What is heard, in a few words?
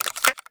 remington insert; weapons; swb